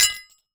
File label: Hole Saw Rattle, Hole Saw Bits